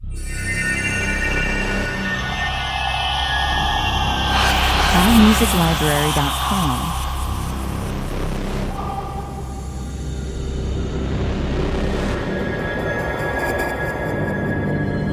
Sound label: heartbeat intro scaremix 70 bpm